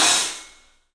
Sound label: CYFX, EFFECTCYMB; 3D Sound; 3D Drums